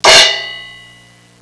CHOKE

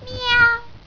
CAT; 动物; 家畜; 猫; 特酷声音素材库

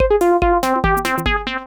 musical

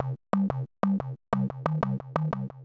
Delay Relay

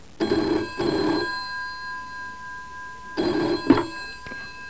PHONE, 电话; 特酷声音素材库

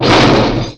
Game, 游戏音效; 特酷声音素材库

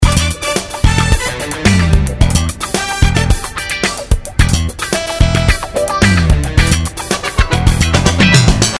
loop

